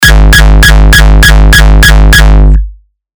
kick peep crunch

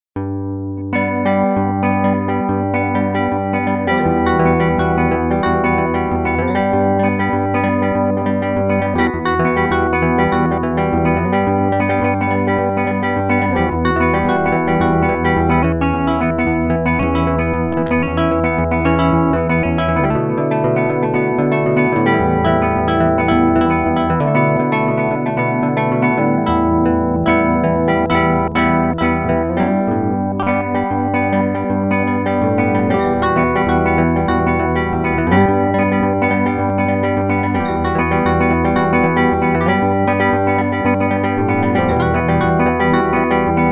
guit neck

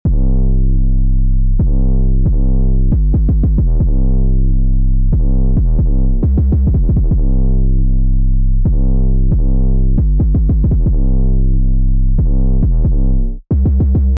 drip, trap; 2 8 expansion trap pack bundle purple edition

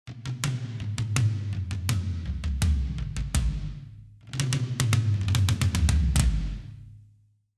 toms; dani loeble impact; klangmacht

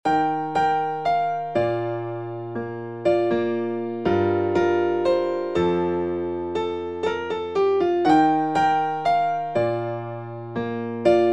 piano dry; soliton; chiral audio; promised neverland

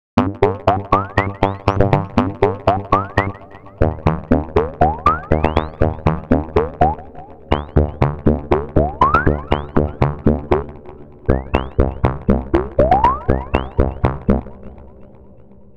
gate 16 steps osc 2 coarse; the meditation soundset

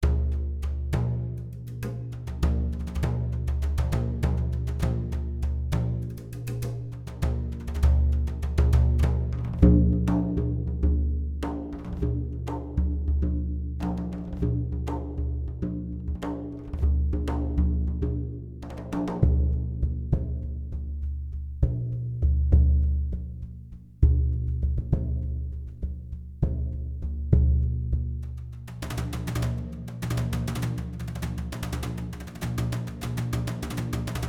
demo framedrum; schallenberg engineering

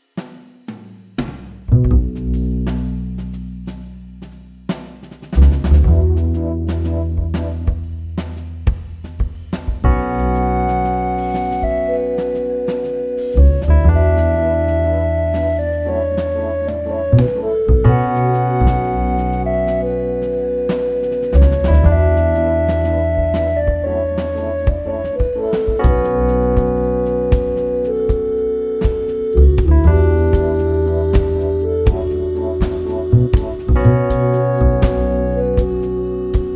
ocarina; soul samples

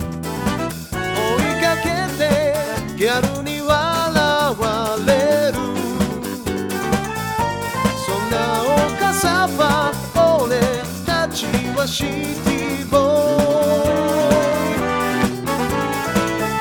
brass distance designer wet; audiobrain

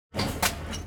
scrapyard three part junk; nova; nova sound

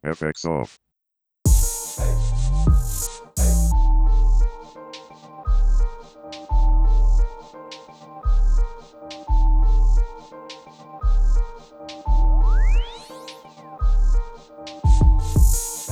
piano bass; fl studio; akira tube; smaolab

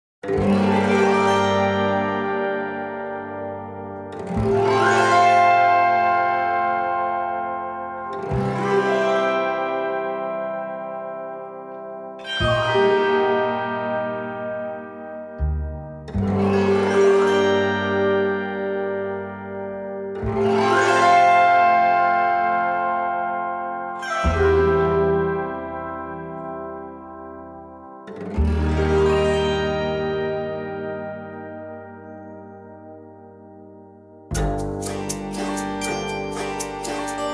waltz, autoharp and taishogoto; firefly

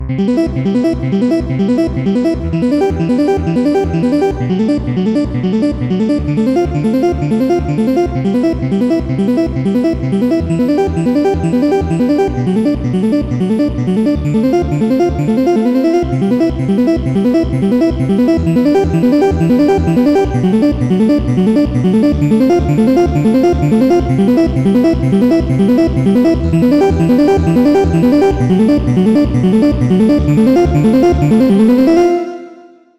synth rezzy lead

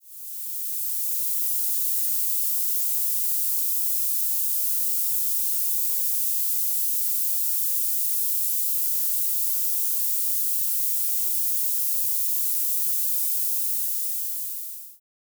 aerosol; noisepalette; sirenfx

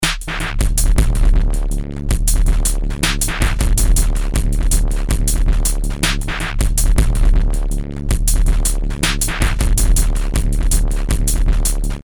terrordub break

